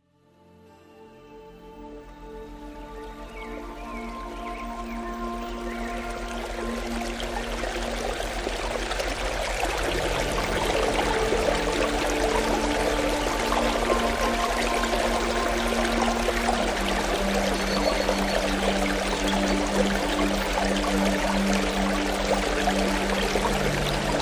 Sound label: relax stream